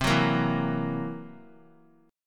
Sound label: C 7th Flat 5th C down, chord; C7b5 strummed; JGuitar